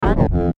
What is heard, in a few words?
Glitch Error Beeps Descend; glitches; Blastwave